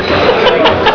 Kneipe

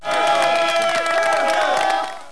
Klatsch; MAUMAU

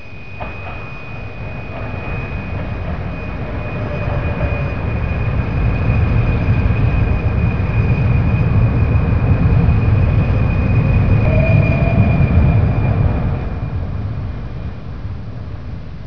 UBAHN

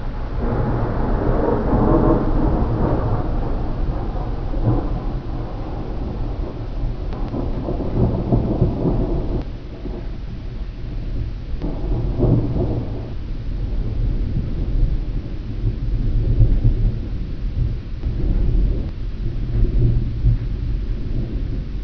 GEWITTER; 999wavs

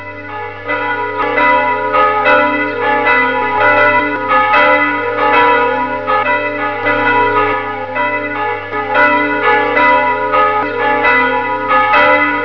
Glocken; 999wavs

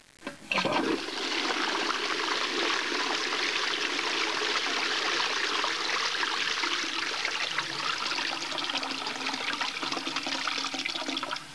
SPULUNG

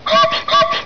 DAMPFBOT; 5thdimen

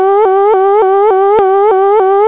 POLIZEI

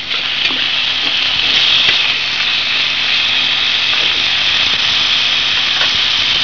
PFANNE

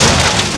rempler; DEMOUK

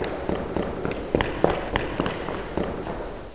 LAUFEN

